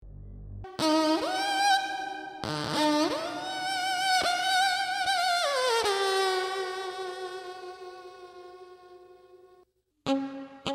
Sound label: noise